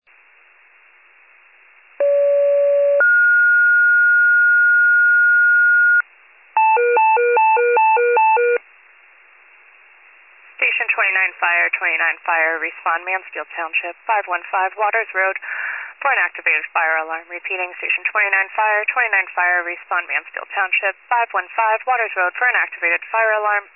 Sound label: fire, pager alert